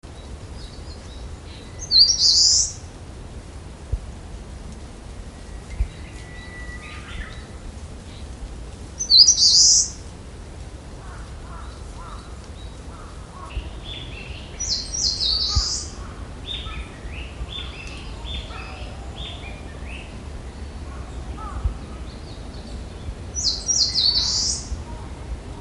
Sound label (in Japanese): senmusi